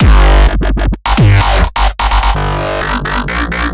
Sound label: 128 G Bass Loop